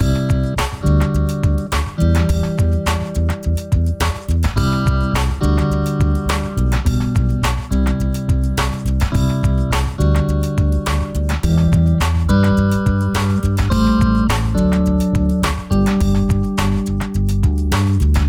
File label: folksy track